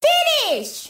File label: finish, timer